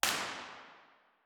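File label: impulse response, nuclear reactor hall; b-format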